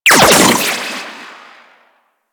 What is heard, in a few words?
Shock Rifle Primary Shot; Warframe; Weapons; Corpus